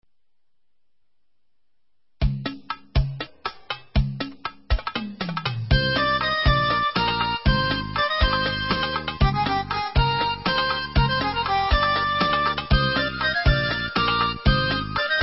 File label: Instrumental